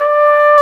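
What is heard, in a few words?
brass trumpet, Roland Solo Brass; Tpt p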